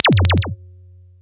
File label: Zapps, sci-fi